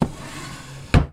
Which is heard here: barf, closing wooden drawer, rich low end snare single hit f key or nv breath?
closing wooden drawer